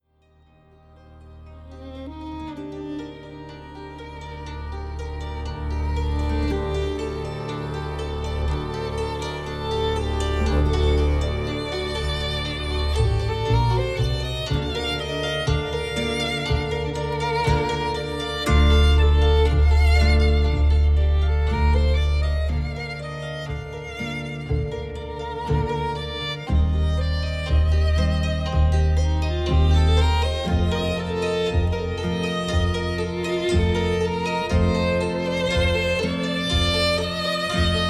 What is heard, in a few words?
toccata arpeggiata